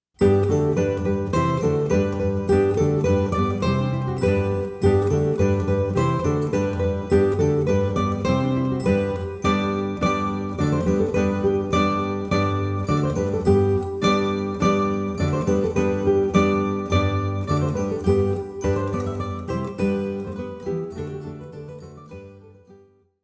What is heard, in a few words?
gavotte